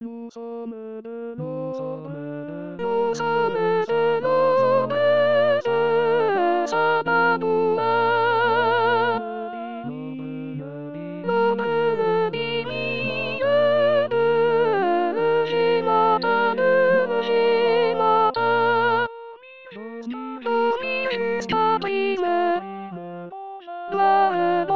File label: soprano; saint babouyn